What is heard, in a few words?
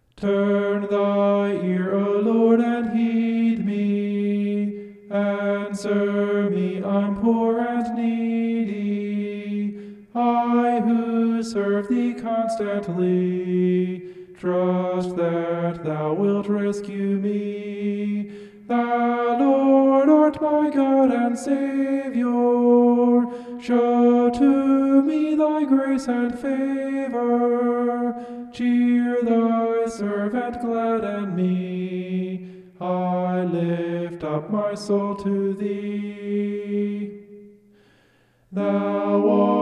vocal homoph; psalm 86